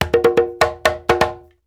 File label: BRKDR, Reggae